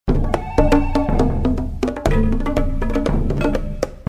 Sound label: jungle, music; Hyperspace Delivery Boy